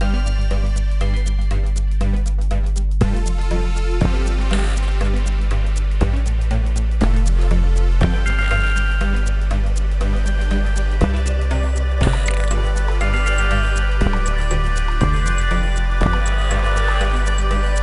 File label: Music Item Fabrication